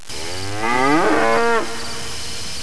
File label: moo, charolais